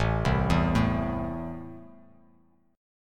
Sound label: A Minor, Bass Standard; Am arpeggiated; 5,3,2,2; JGuitar